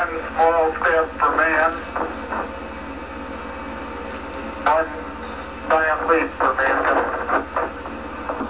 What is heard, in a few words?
step